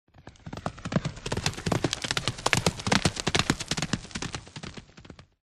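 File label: By Horse Up Past At Gallop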